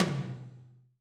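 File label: Mid Can, Alesis SR16 drum machine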